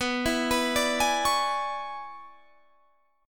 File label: E 13th B